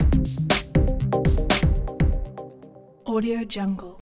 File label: musical theme deep techno; mobile game